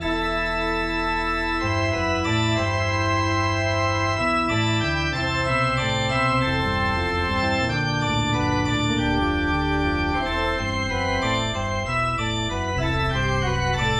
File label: Organ Music; MIDI; Dragon Ball Z The Legend Of Super Son Goku